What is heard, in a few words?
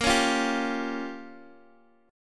Bb Minor 6th, Guitar, DADGAD; strummed; x,x,8,6,8,5